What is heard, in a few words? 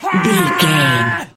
voice male battle shout long